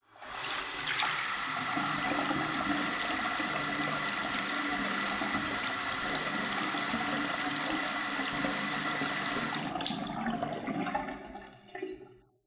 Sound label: Bathroom Running Tap